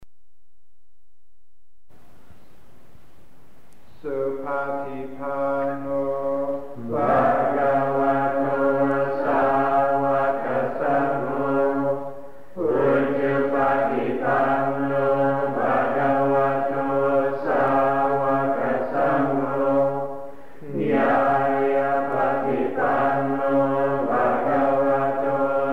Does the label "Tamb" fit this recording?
No